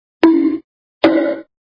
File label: Cloinks, cartoons